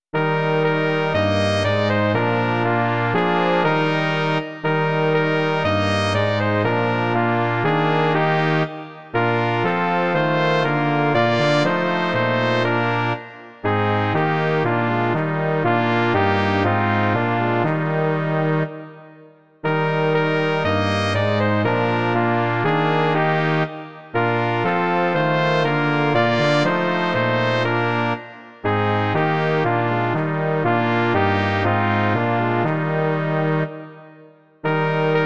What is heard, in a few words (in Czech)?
žesťové kvarteto; Onen hněvu den se blíží; Imaginativní verze